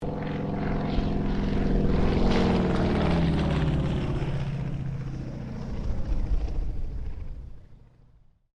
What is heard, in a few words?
AIRCRAFT CAC WIRRAWAY PASS